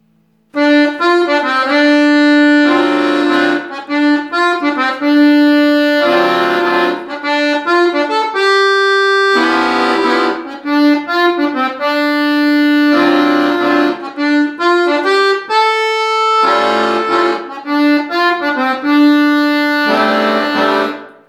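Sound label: a small jazz piece akkordeon, accordion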